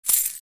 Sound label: Handling coins quarters